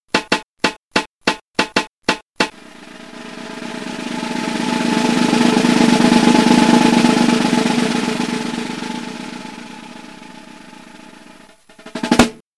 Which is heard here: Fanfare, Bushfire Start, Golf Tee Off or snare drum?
snare drum